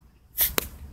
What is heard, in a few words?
shoot; Teemos Dart Shooter Cosplay Prop With Arduino